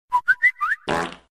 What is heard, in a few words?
funny sound effect